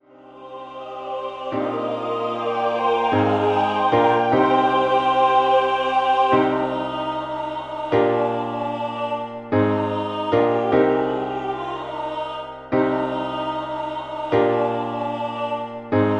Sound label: MIDI SATB